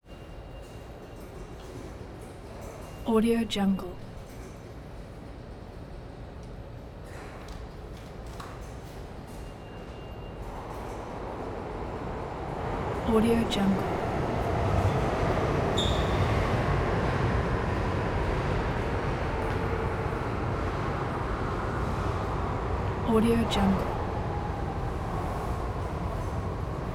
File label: subway station new york union square